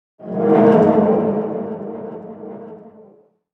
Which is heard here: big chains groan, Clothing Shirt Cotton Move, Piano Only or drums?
big chains groan